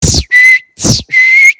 silbido

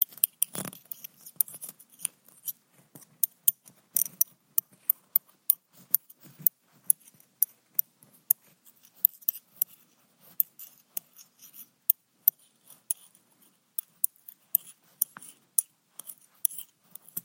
sonido de tejer

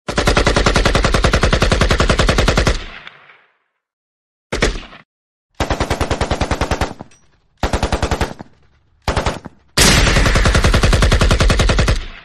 ak47; tiro

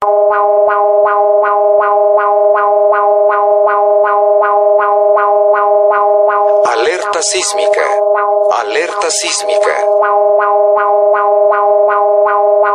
alerta sismica mexico

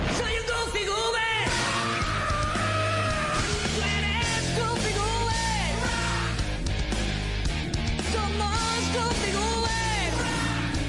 goofy castellano

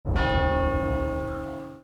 campana

